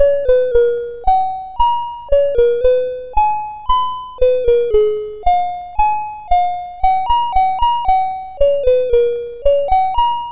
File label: sid MOS6581R2; Ocean Conqueror